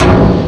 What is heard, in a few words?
bounce; VM Viper